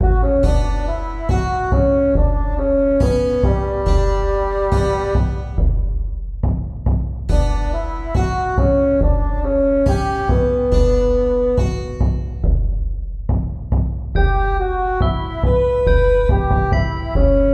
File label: Stompy Feet; musescore